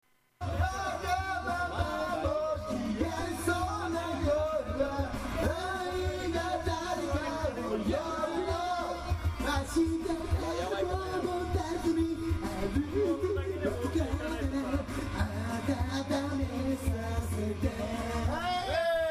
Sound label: white breath